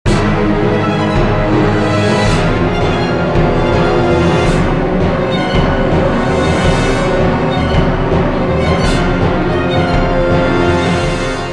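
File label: zombie start, song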